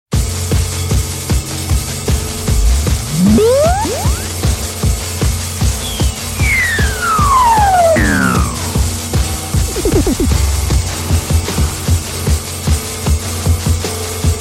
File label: instrumental version; 7" bside mix; Reappearing Records